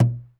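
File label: Uganda drum; Spectrasonics; Heart of Africa 1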